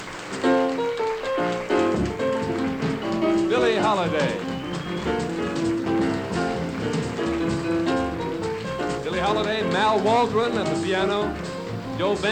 Fanfare With Announcement Live

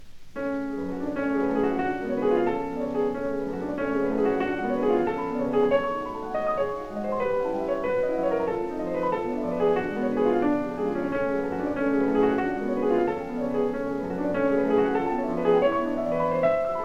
Prelude in F-Sharp Minor

